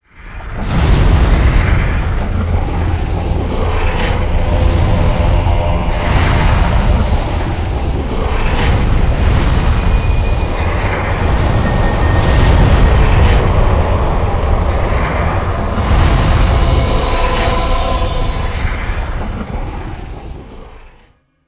lava; Knights Of The Temple 2; XBOX

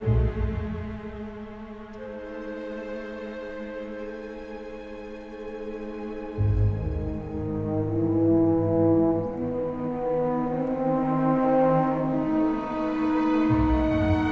suspense, music

